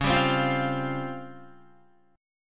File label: Db Major 7th Flat 5th, Guitar; DbM7b5 strummed; JGuitar